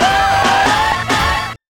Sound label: Ending, Lounge Breakz, 137